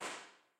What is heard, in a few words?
The Beautiful Room, Electro-Voice RE20, impulse responses; Henry Olonga